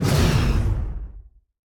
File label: Rocket Long Cutoff